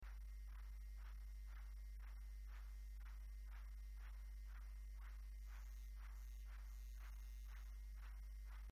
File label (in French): MS-20 claquement de mains